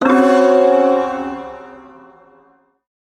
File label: Shawzin Hirajoshi Chord; Warframe; Duviri Ersatz Shawzin; Chords